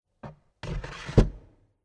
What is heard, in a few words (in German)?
Stuhl Bell Chair aufstapeln